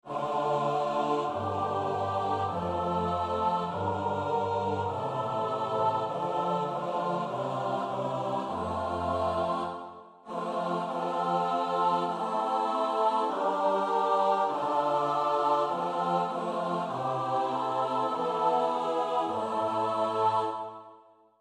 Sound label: Psalm 104 (S-A-T-B); gesplitst; Goudimel; Oefenbestanden